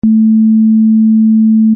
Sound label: Sine A